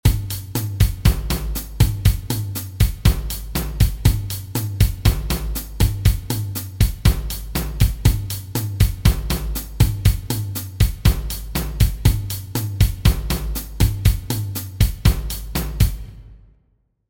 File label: Samba